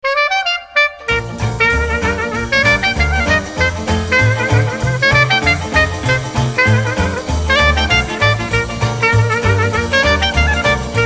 creole jazz ringtone

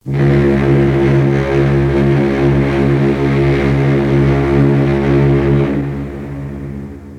horn of a ship ringtone

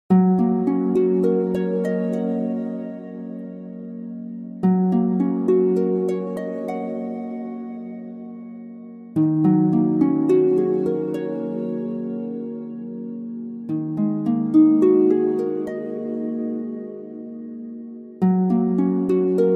relaxing harp ringtone

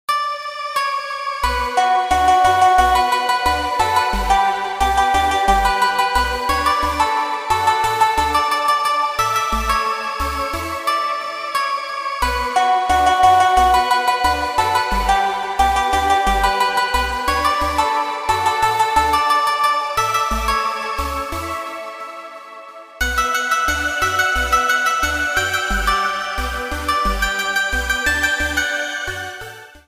instrumental ringtone

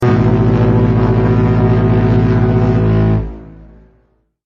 ship horn sms ringtone

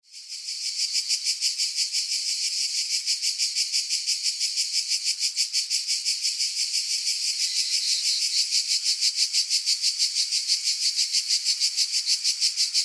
cicadas sound ringtone

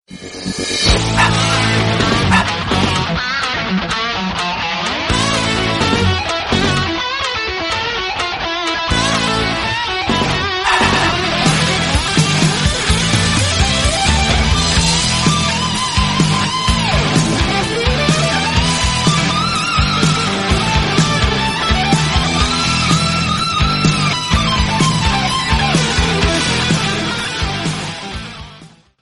rock on ringtone

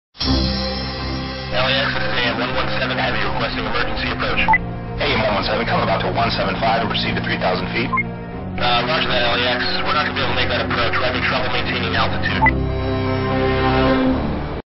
radio police ringtone

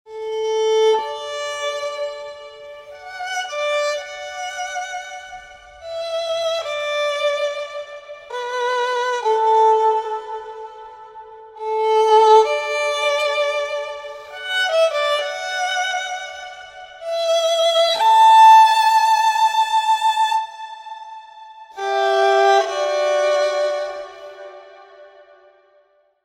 amazing grace violin ringtone

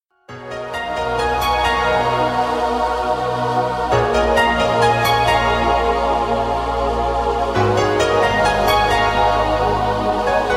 anime nice tone ringtone

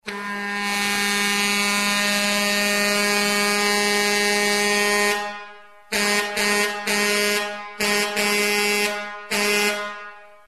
truck ringtone

